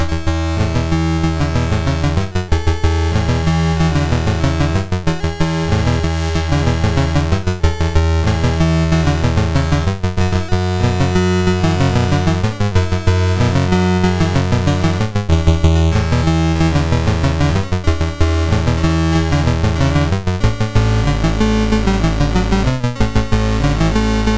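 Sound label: Magicians Ball, MOS 6581 SID chip music, games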